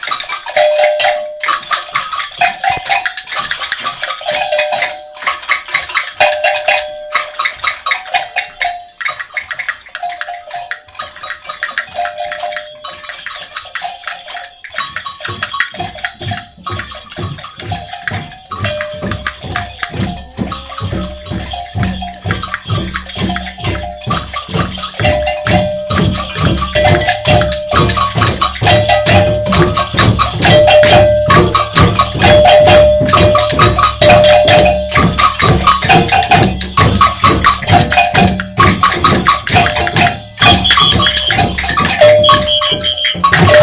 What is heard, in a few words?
samba